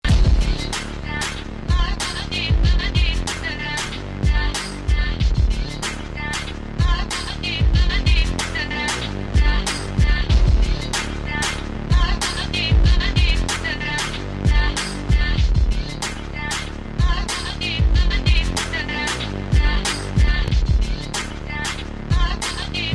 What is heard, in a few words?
Arabic Persian Beat